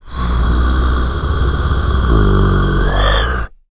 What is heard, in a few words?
GROWL, VOCAL FX; Spectrasonics Vocal Planet; Jazz FX